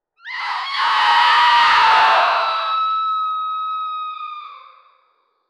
elephant scream roar

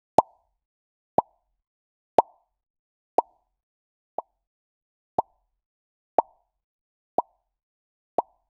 small pop click bubble

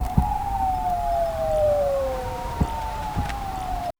tiny crackling fire soft

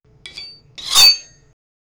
the sound of a knife; high volume

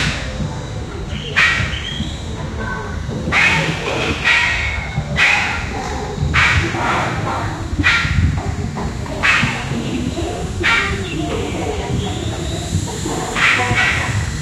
velociraptor

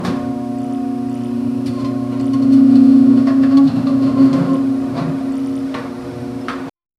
heavy metallic door creak; laboratory

